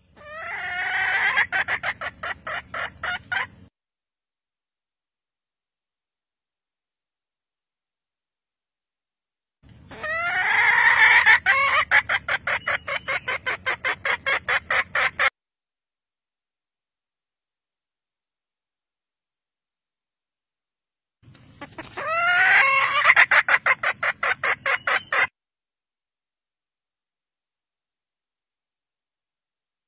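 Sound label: sound of hen calling in the jungle; Tieng Ga mai rung goi trong chuan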